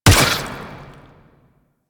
Infested Burst Rifle Shot; Warframe

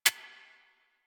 Decoy Counter Measure Mech

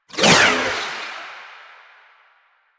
Parazon Whoosh; Kuva Lich Finisher; Warframe; Finishers

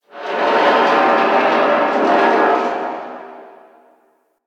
Asteroid Field Ship Metal Creak E; Warframe; Ambience; The War Within

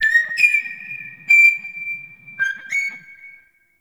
Forest Bird Mid; Ambience

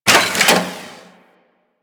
Venus Research Facil Target Arm Start; Objective Rooms; Props One Offs

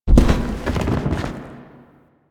Beam Battle Fall; Warframe; The New War; Ballas Lotus Beam Battle

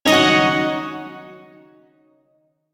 Shawzin Chord; DE; Warframe; Duviri Shawzin

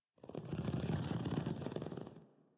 Pet Purr; Enemies; Warframe; Catbrow; PetShip

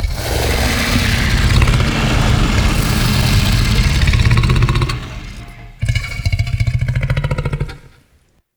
Pit Monster Exhale, Infested; Sleep; Warframe

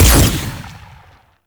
Fire Shot; Warframe; Weapons; Duviri; Incarnon Adapters; Incarnon Dex Sybaris

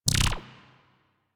Corrupted Notes K; Warframe; Bard Quest; Bard Boss Fight

